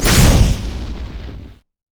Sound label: twinbite human; LoL; shyvana